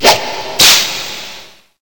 whip

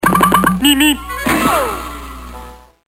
roadrunner

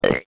burp

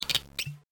uncork

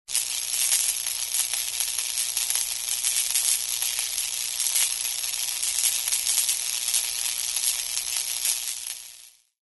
Sparking

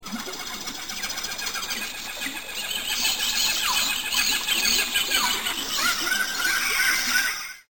Jungle

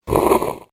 drink

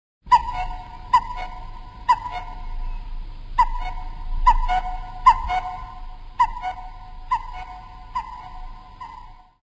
cuckoo

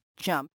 jump

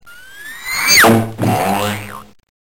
ZIP BOING

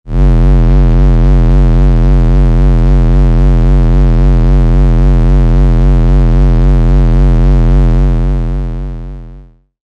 Space Pulse

